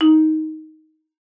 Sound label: D#4, marimba; Xsample VOL-4